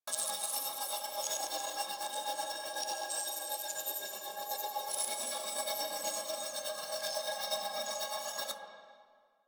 Fairy Idle Loop; DE; Warframe

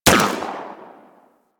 Laser Fire; Warframe; Venus; Venus Terra Moa; Weapons

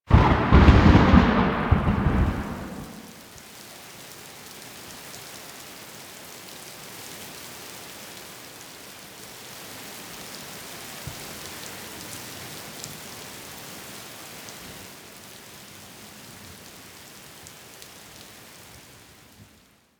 Rain; Warframe; The New War; Narmer Outpost

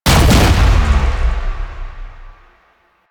Corpus Projectile Explosion; Warframe; Jade Shadows; Ascension

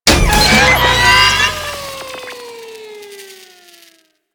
Jackal Stun Deactivate; Warframe; Jackal Bursa

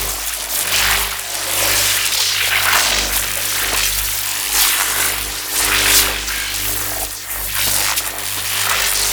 Void Shock Loop; Weapons; Duviri; Incarnon Adapters; Warframe

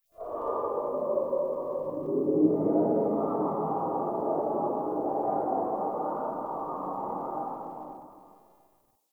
Exterior Distant Calls Scared, Ambience

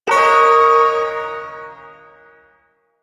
Shawzin Yo Chord K; Warframe; Duviri Ersatz Shawzin

